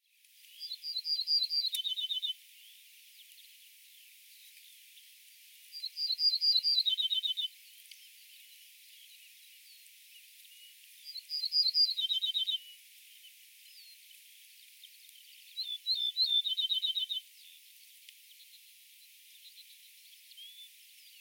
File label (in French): bruant ortolan emberiza hortulana